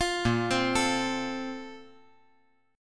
Bb Major 7th Suspended 2nd; BbM7sus2 arpeggiated; BbM7sus2 Chord; JGuitar

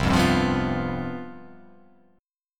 C Major 7th Suspended 4th

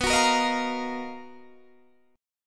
B Major Sharp 11th, Guitar, DADGAD

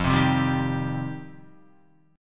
F 7th Suspended 2nd Guitar, chord; F7sus2; strummed; JGuitar

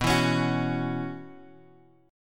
B Suspended 2nd Suspended 4th, Bass Standard

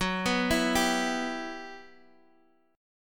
E Minor F sharp, chord; Em/F# Chord {X X 4 4 5 3} arpeggiated

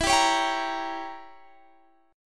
E Diminished 7th E down Guitar Half Step Down

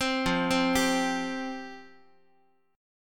F Suspended 2nd F down Guitar; Fsus2 arpeggiated; JGuitar

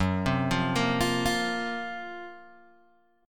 F sharp Major 7th Flat 5th F sharp, Guitar Open G; 4,5,3,3,6,3

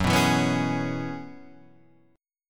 F sharp 9th Suspended 4th, Guitar, Standard; 2,2,2,4,2,4; strummed

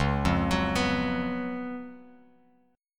Db 11th Db, Bass Standard; arpeggiated; JGuitar

